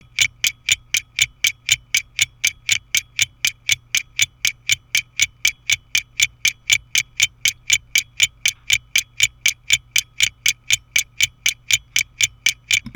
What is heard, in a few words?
Tick Tach Mic Test 14400, watch; Smiths Pocket; timegrapher mic for watch enthusiasts